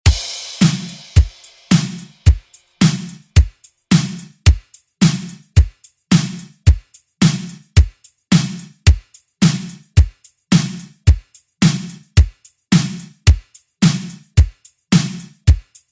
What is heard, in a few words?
80s Re Drum